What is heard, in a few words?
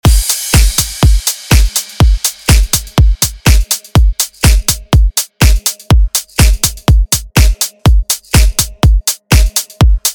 sunset mix